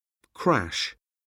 crash; Oxford Word Skills Intermediate

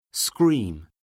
scream; Oxford Word Skills Intermediate

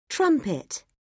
trumpet; Oxford Word Skills Intermediate

